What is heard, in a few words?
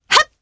Vox Jump, jp; Selene; kingsraid audio